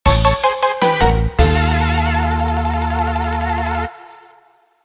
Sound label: BGM Result Failed